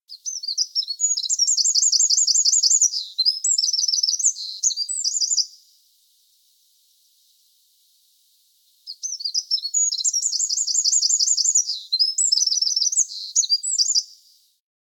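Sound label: wren voice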